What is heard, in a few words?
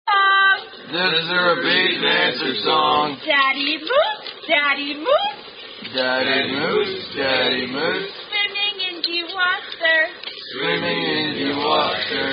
Moose Camp Song; The Moose Song